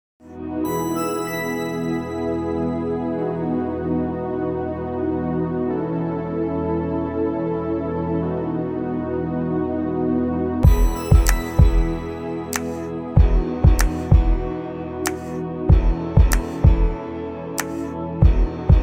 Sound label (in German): Rap